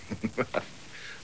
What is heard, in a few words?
laughs